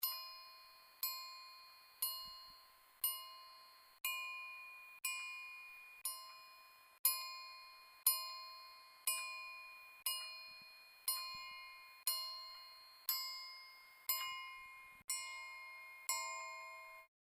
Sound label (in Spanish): Triángulo